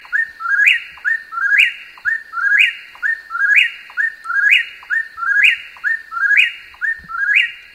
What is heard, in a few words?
whip poor will